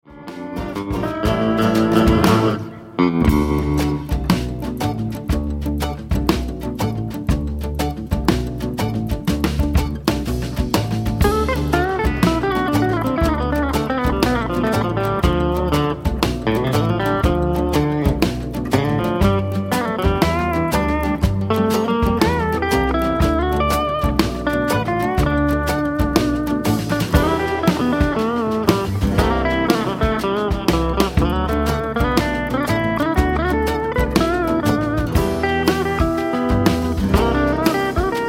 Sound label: Guitar Stumble